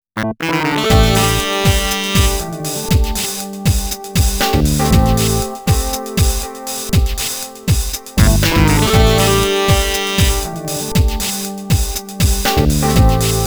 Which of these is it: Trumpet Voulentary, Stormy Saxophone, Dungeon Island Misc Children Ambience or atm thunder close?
Stormy Saxophone